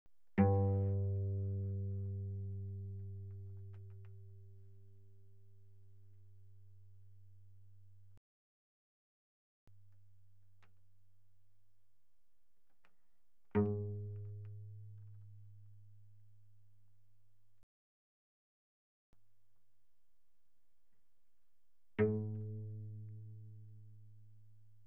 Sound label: Cello pizz mf sul G G2 B2; Strings